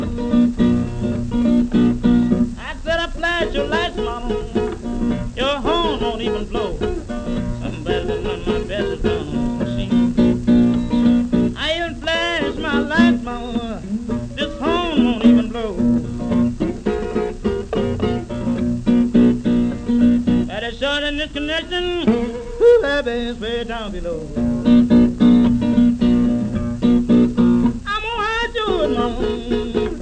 blues

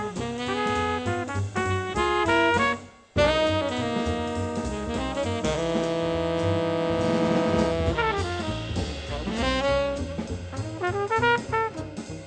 jazz

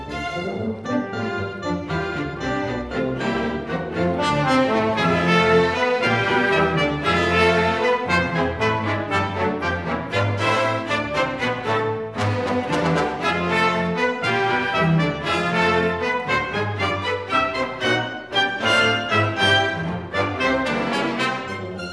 classical

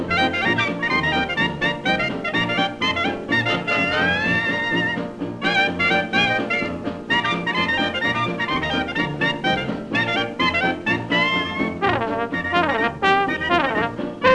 jazz

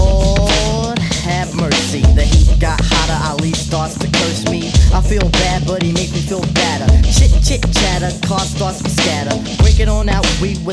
hiphop

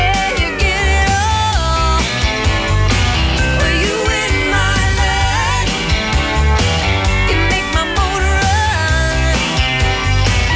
country

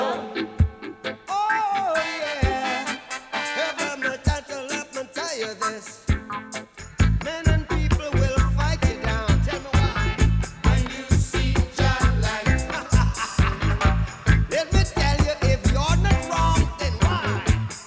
reggae

